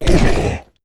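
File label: ghost damage, monster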